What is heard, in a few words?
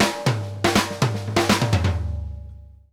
MIXIN, SP FILLS 120, Drumworks; Sampleheads - New York City Drumworks VOL-1